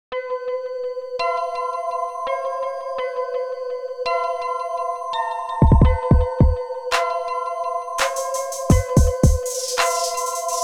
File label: Beat